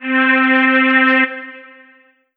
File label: Yamaha MU90R Mello Strings C4